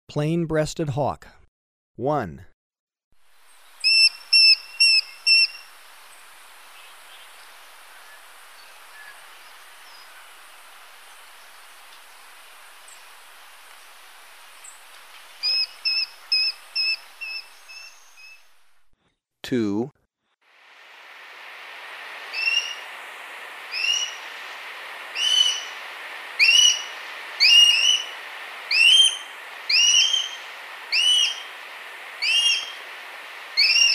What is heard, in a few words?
Plain-breasted Hawk, Birds of Ecuador; S. America